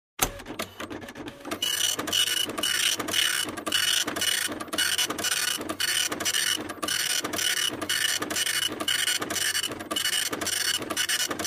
Receipt printing

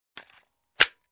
Reloading Pistol slowly

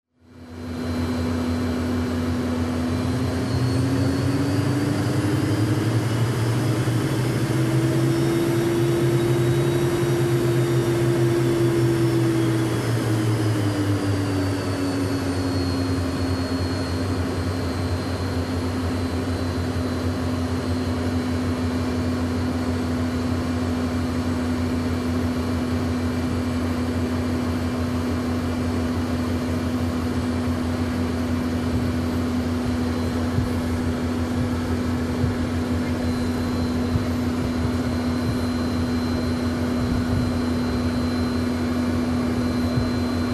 Aircraft Ground Rolling Cabin Ambience

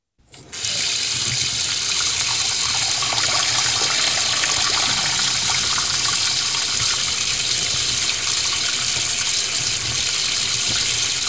Kitchen Sink Washing

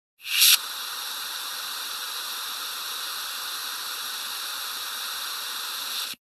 Air Tire Pressure Filling

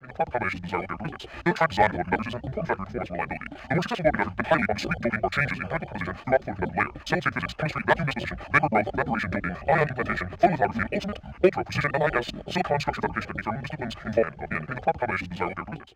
Vocal Garble

